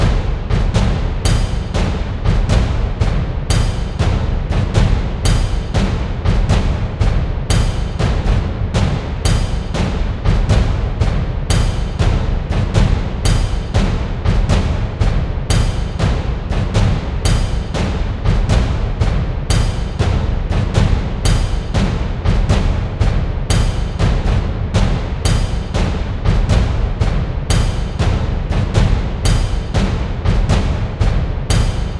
Ice Fields perc; Level2 Ice Fields

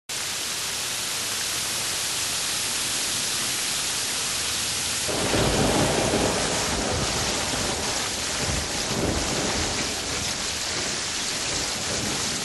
Rain sound effect; Terraria

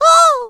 surprised; Scrat

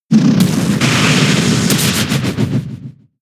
Horn Drill; pokemon sfx gen 7 attack moves sumo usum

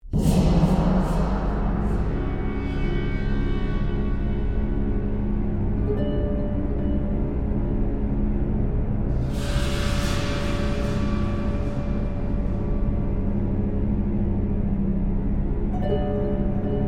menu music; Hector Episode 1 We Negotiate With Terrorists

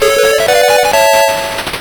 Slot Machine Win; Casino Games; Master System

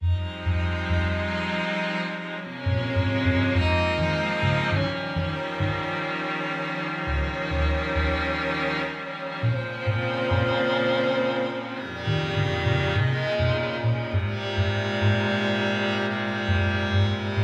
Instrumental; Wolfskin's Curse

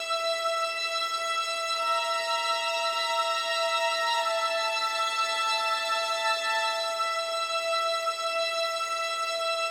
sounds music; Halo Combat Evolved; Xbox

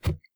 thick plastic soft

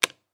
Switch plastic; Five Nights at Freddy's The First Generation

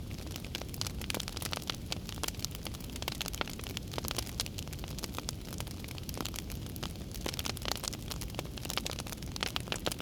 Arrow Fire Loop; Timelock VR

